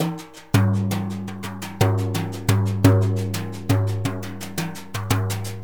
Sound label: Synclavier Percussion, World & Orchestral; ILIO; Synclavier Percussion 2